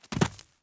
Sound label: footsteps boot wood jump